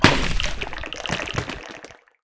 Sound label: Gib